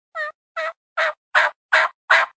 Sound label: Plain Hen Yelp, predator calls